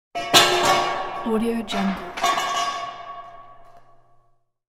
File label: crash steel pipe distant